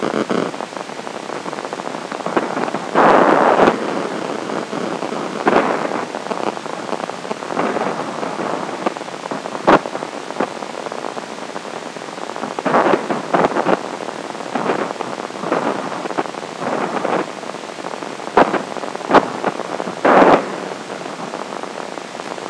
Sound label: Cricket